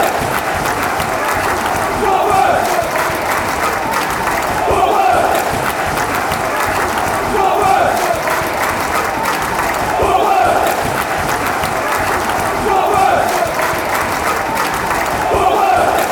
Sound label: clap, fanchants; watford